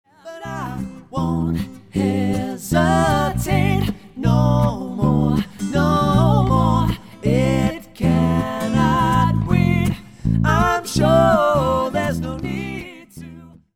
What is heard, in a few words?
Acoustic Duo LIVE